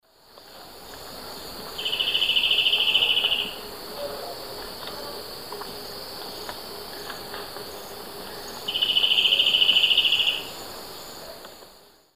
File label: Ramphocaenus melanurus, song; S. America; Eastern Lowlands